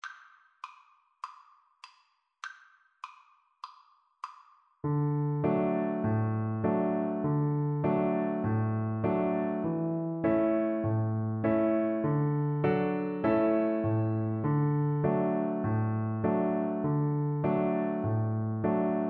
DB, Double Bass

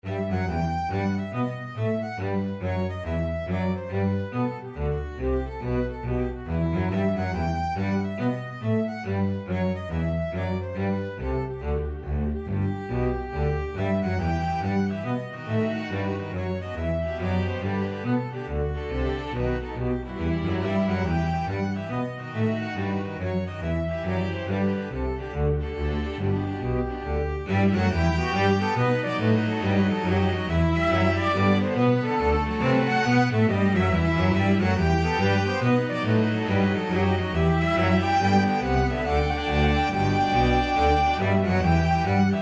flexible ensemble, 4 players

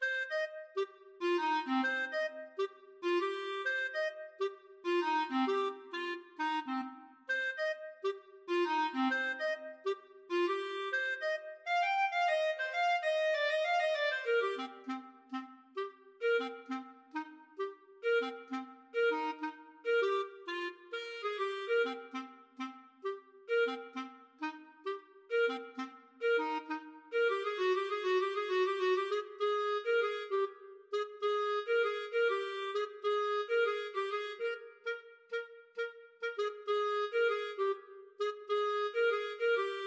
Funk Study No 2 clarinet

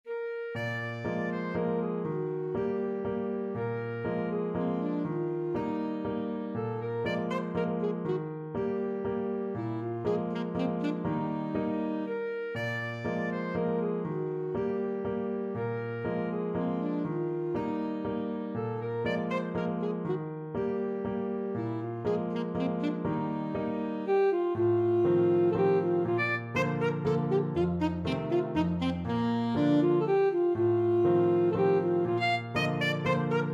waltzes ASAX, saxophone

